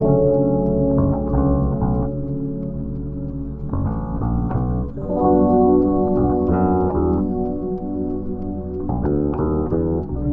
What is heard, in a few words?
keys, Phonky; loops